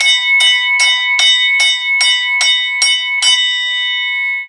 bells train

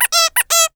funny cartoon squeaky creature

